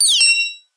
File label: UI Double Beep